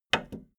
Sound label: video tape player plastic stop click slow